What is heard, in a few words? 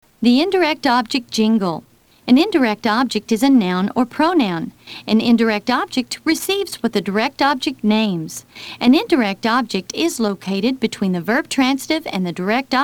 indirect object jingle